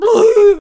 Death Vocals; Sensei

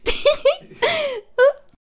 Attack Vocals

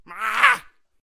Attack Vocals

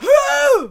Attack Vocals

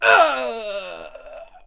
Archer Death Vocals

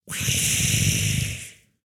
Skeleton Archer Attack Vocals

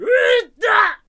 Shield Bearer Attack Vocals

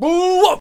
Attack Vocals; Sensei

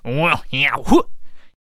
Ball and Chain Attack Vocals

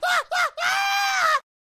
Barrel Roller Attack Vocals

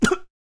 Boxer Death Vocals

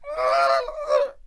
Bomb Cannon Death Vocals

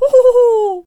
Fencer Attack Vocals

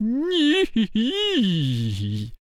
Chariot Attack Vocals

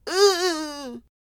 Cactus Attack Vocals

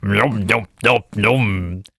Vampire Attack Vocals

